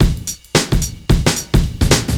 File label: Beat 110bpm; musicradar